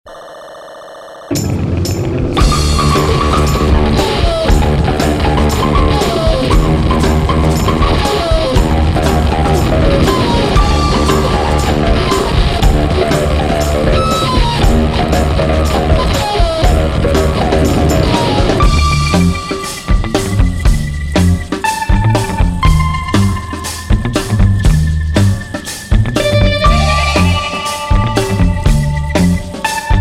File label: Instrumental